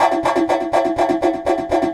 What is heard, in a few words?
Echo Chuff, Synthetic Pulses